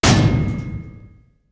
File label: parry; SupaLidlGame